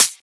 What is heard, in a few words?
Closed Hat; FLPRO